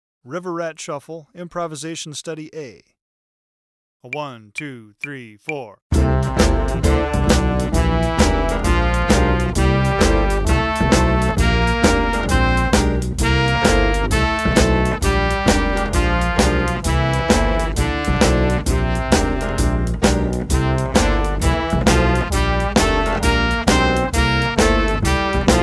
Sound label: shuffle improvisation